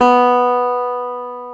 GTR, Dobros, National

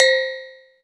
Synth Bells; Dream Zone

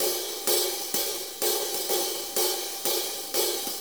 Blues HiHats; Sonic Foundry (Sony Creative Software); Drum Tools; HiHat Loops

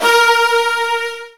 A#1, Virtuoso Strings; ILIO; Kirk Hunter Virtuoso Strings VOL-2

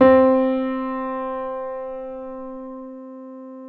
PNO MF; Synclavier Sampler Library

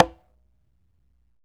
L CONGA SL, CONGA

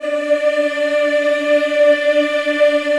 D4, Choir; USB Soundscan vol.28; Acoustic & Synth; AKAI

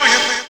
Fx Vox; Equipped Music; Loungin House

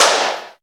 FAT CLAPS; DR.ACCESSOR

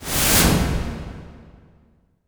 IMP WIPE, Cinematic Impact

